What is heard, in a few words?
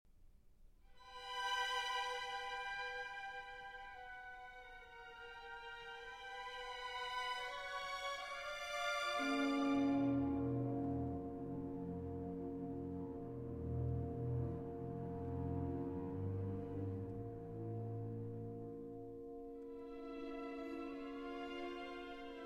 Cello Concerto, Adagio